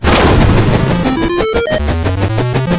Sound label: thunder up